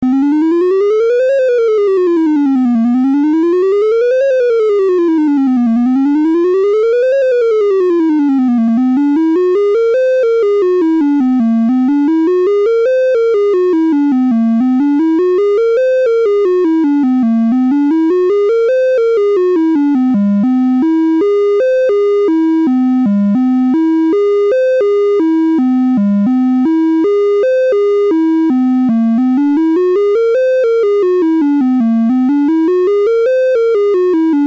seq half whole fourths, analogsynth, VOLTAGEQUANTIZER